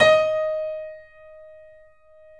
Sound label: 3D Pianos Yamaha C7; E-MU Producer Series Vol. 5